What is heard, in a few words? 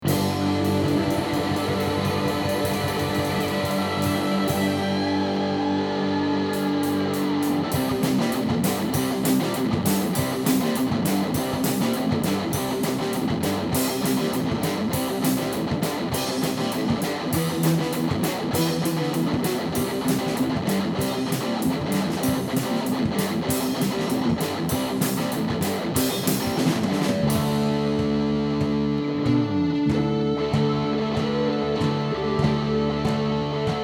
hero medley without vocals